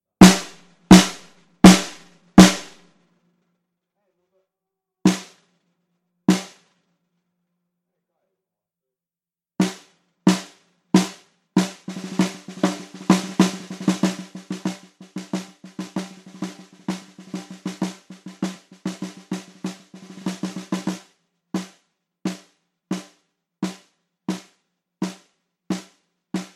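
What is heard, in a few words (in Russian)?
отрывок из саундчека ударные инструменты на выбор нижний микрофон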